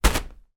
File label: foley paper lampshade sphere type hit with hand